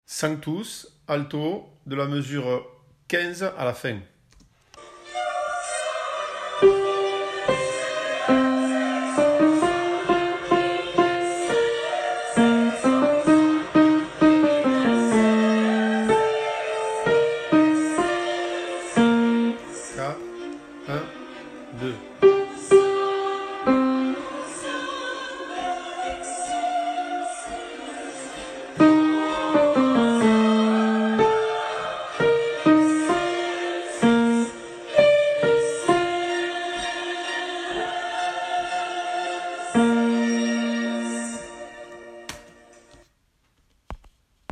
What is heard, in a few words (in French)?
sanctus alto; sanctus de 15 à la fin